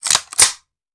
Machine Gun Foley; Sound Ideas; Guns

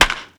Baseball Bat Crack

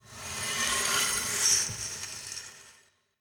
freeze aggressive; Apotheorasis

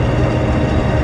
Door Large Metal Loop; SFX Kit; Doors

